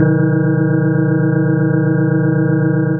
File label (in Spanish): pvadd sinusoide